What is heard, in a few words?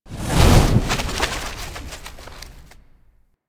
Infested K Drive Boost Fast Stop, Vehicles